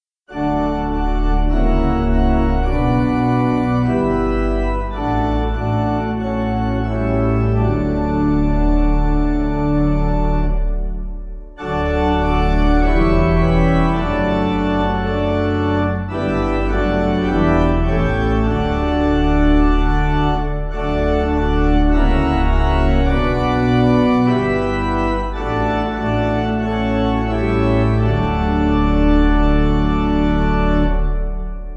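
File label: Pipe, Free Music Backing Files for Churches; Hadley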